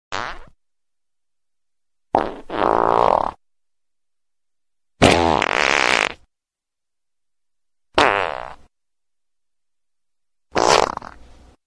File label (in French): Bruit De Pet